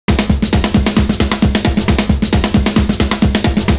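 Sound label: fast snare, 133.5; Univers Sons - Kitch 70s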